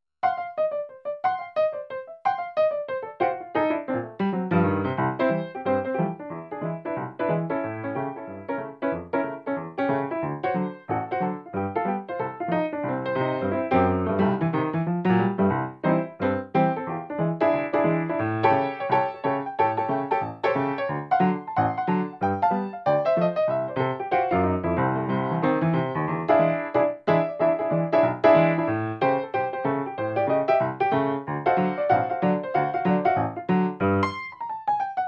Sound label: Ac Grand